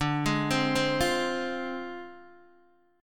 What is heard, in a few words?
D Minor 7th Flat 5th Guitar Open D